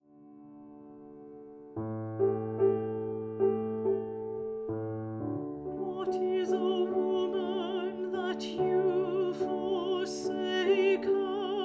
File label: Harp Song